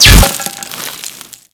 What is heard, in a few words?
Bolt Impact Stone; Warframe; Cross Bolt